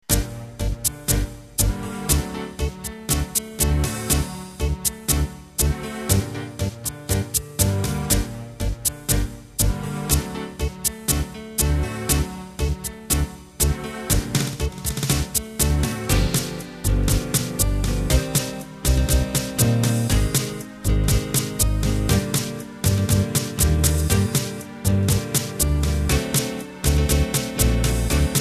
Tango Ballroom, ballroom Tango with Acoustic Bass, Acoustic Piano, Nylon String Guitar, and Strings